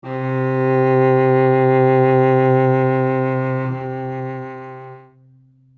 Cello Note C2

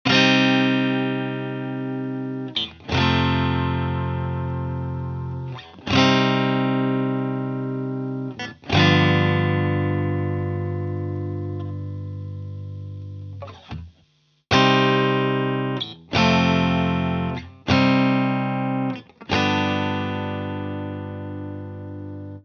Minor Chords Fast and Slow

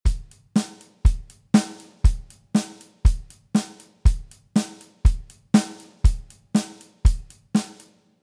Drum Groove Straight

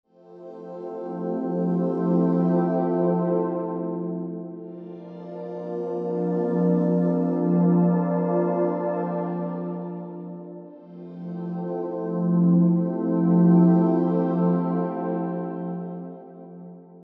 Soft Synth Swell

